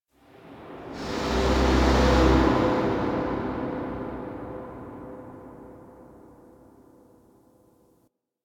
Drill Blast A; Warframe; Ambience; Extraction 2; Extraction 2 Drill Blasts

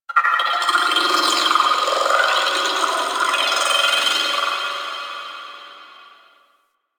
Orphix Shield Pre Disable One Off; Warframe; The New War; Sentient Devourer

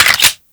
Lever Action Rifle Reload A; Grineer

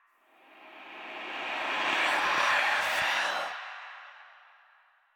Cave Whispers

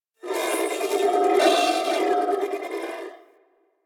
Sentient Symbilyst Idle; Enemies; Barks

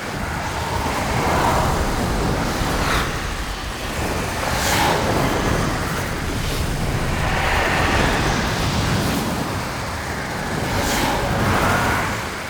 Hazard Fracture Loop Base; Warframe; Duviri; Undercroft Hazards

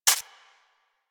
Rodent Step Dirt, Forest Rodent Footsteps; Characters; Foley; Warframe

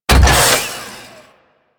Saw Blade Launcher Mech; Warframe

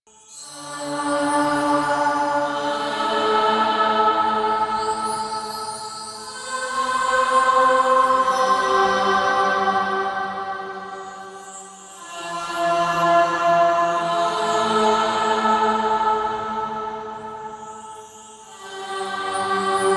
Flying Music Loop; Warframe; Bard Quest; Mission Two

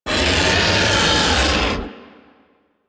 Vocal Attack Short, Enemies; Warframe; Teralyst; Ropalolyst

